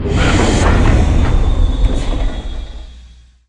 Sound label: large docking port; Barotrauma; barotrauma ek